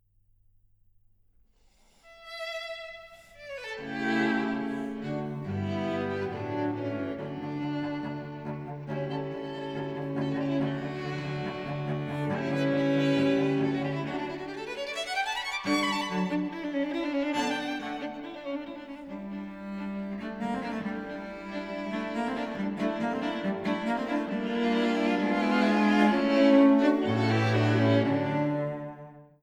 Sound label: string quartet allegro